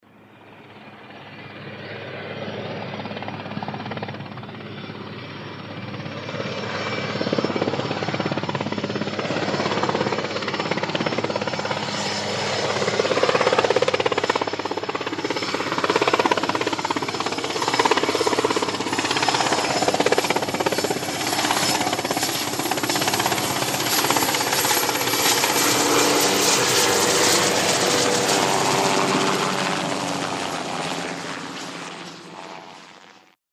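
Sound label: Heli Traffic Approach By, helicopter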